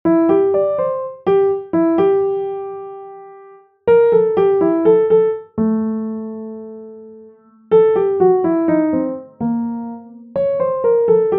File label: bossa nova